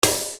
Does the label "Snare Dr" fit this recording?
Yes